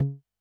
Tomtom High, Technics U90

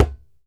DJEMBE, African Drum

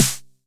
909 SD; Goldbaby Tape808 & Tape909; The Tape 909

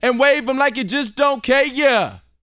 VOCAL F; ILIO

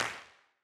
Wider Clap

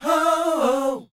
SHOO BE, Vocal R&B Dance

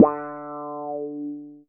GUI MULT; EastWest; Dance Mega Hip Hop Electro

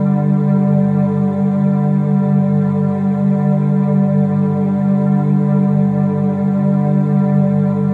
Pan Drone; Sonic Foundry (Sony Creative Software); Numina II

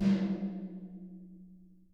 toms, Real Mega Drums

